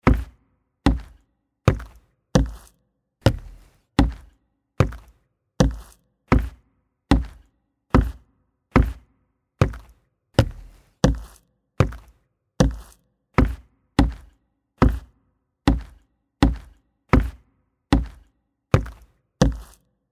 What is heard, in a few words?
footsteps wood walking